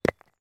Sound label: impact concrete slab drop on rubble pile